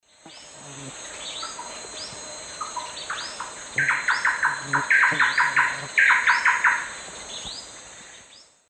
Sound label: Campylorhynchus turdinus, calls and duet; S. America; Eastern Lowlands